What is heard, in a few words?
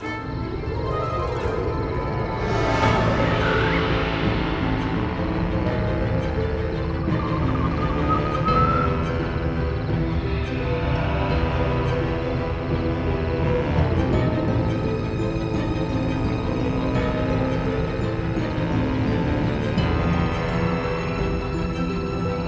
Spooky Forest Combat; Shrek 2; GC PS2 Windows Xbox